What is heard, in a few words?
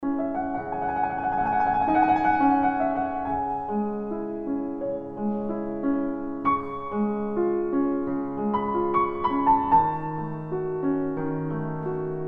soothing mobile ringtone